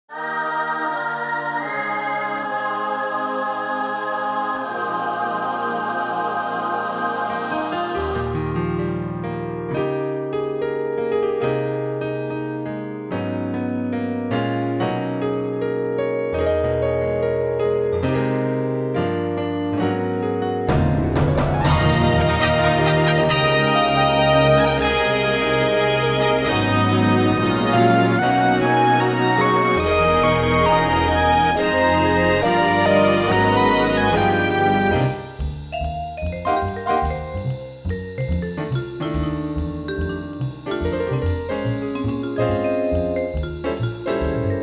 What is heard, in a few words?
yamaha tg77, pot pourri